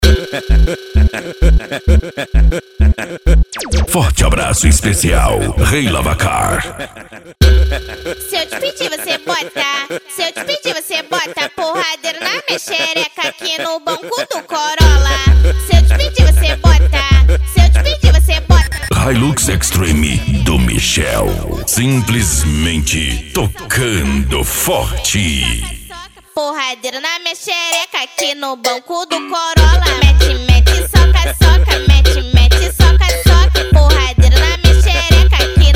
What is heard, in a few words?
funk mega bass